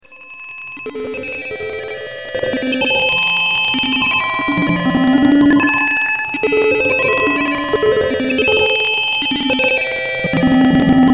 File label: vectrex glitch